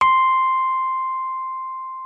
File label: Rhodes C6; Xsample VOL-1; 90 s Sample CDs